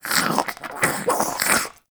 Close Goop Release; Ambience; Warframe; Infested Eris

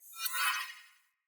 Sword Whoosh Accent Heavy; Weapons; Player; Swords; Fey Sword

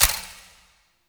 Countdown Tick; UI; Undercroft; Warframe; Duviri

